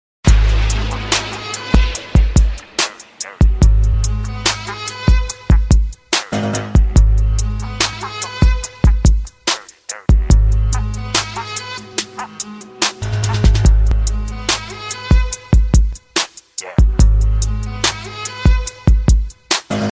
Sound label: instrumental